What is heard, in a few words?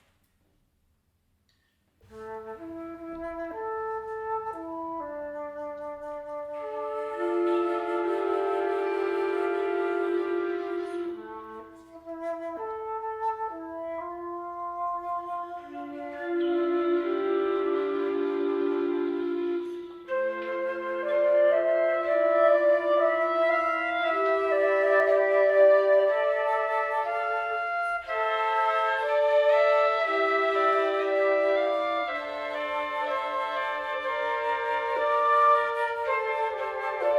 heavenly strains